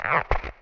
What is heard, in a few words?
contact perc